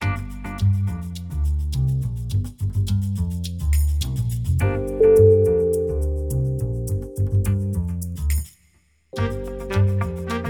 jazzy lounge

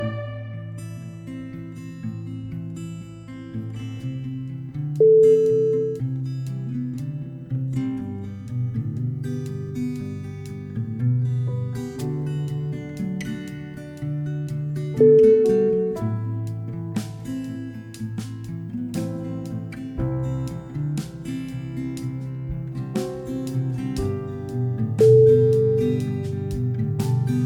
sad acoustic indie

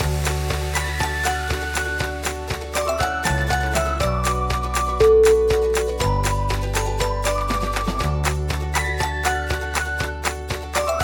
toys and ukuleles

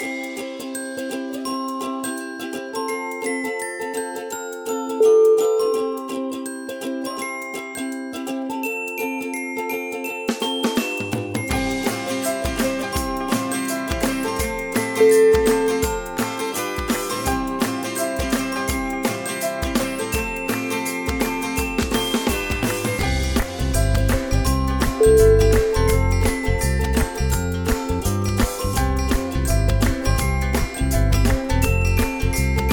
upbeat feeling

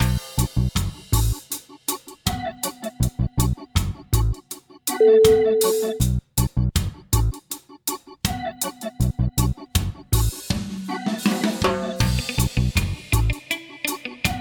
reggae

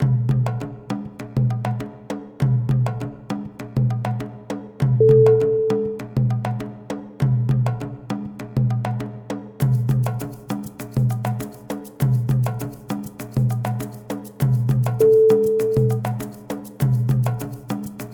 african drums

